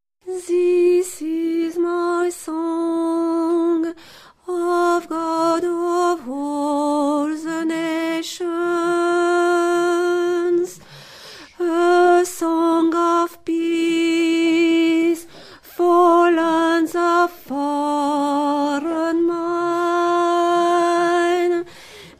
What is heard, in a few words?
Finlandia, alto